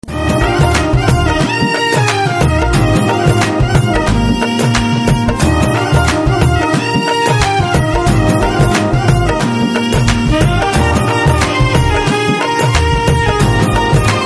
Drum Beats Ringtone

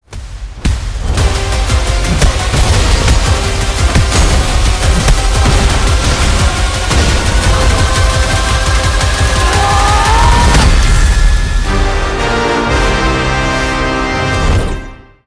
Trailer Hindi BGM Ringtone; WAR 2

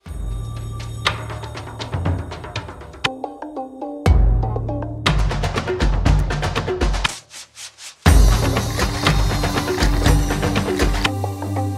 BGM Drum Beats Ringtone; GlobeTrotter

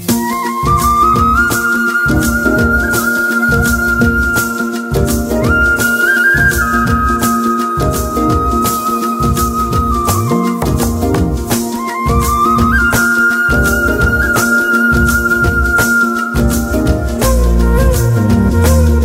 Flute Tone

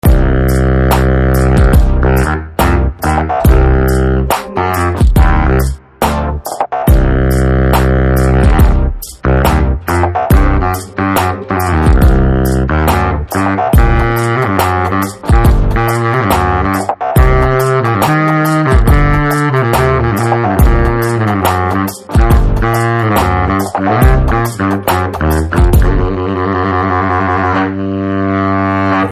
Saxophone Ringtone

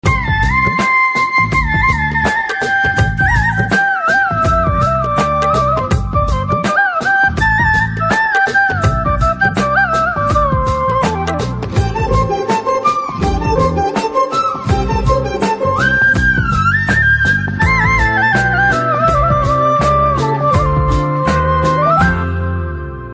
Fun Flute Ringtone

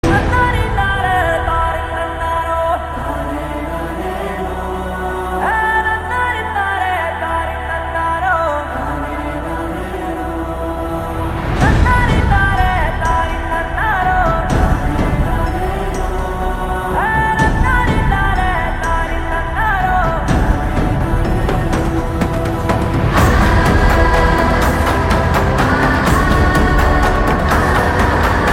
Mother Emotional SAD BGM Ringtone

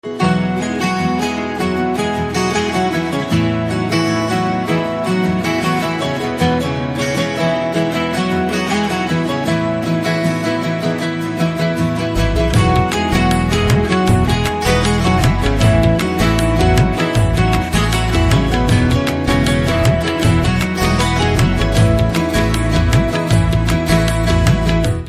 Instrumental BGM Ringtone

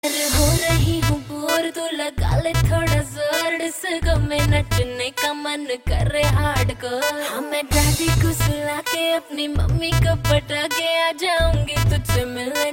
Romantic Ringtone

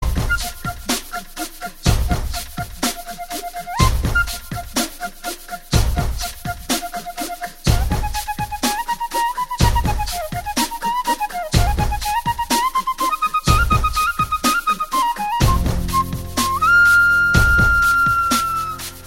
BGM Flute Ringtone

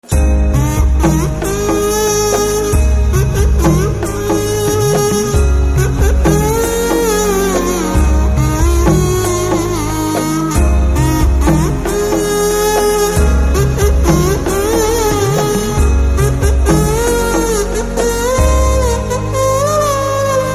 Instrumental BGM Ringtone; Uppena

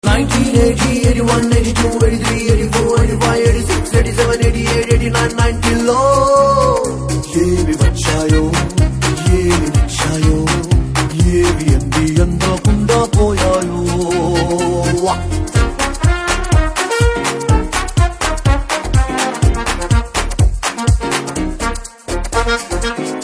Ringtone

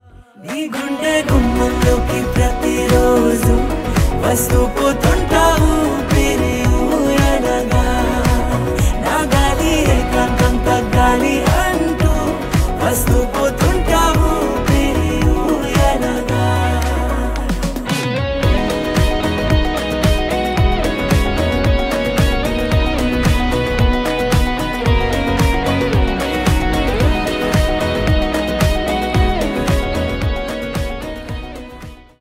Ringtone Male Version; WAR 2

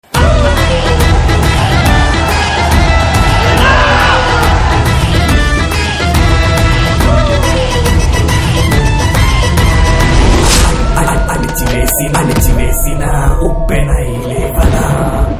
Teaser Telugu Theme Ringtone; NGK

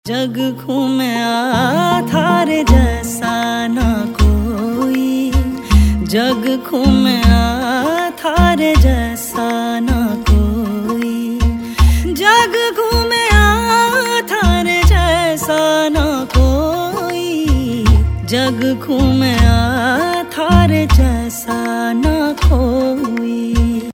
Female Version Ringtone

